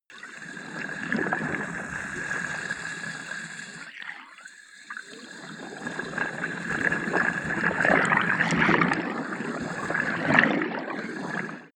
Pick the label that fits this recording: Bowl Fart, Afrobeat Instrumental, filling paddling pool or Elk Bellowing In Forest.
filling paddling pool